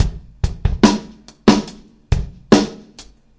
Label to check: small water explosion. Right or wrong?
Wrong